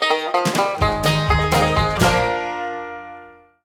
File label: intro Free Spin; netent; deadoralive